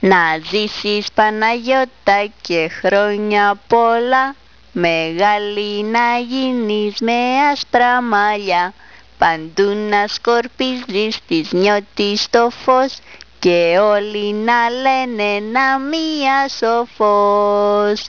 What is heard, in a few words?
greek song